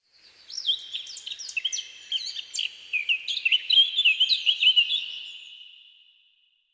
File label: Bird Blackcap; Warframe; Ambience; Nineteen Ninety Nine